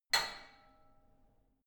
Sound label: barbell only lift up soft, fitnessstudio